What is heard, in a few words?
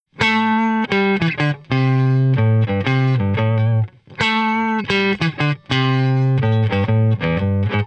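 Blues Guitar Intro; Digidesign; Pro Tools Essential Loops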